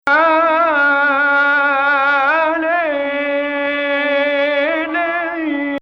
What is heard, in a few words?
Nahawand; Zanjaran is fairly rare, but quite beautiful maqam